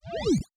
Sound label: sine wobble, bass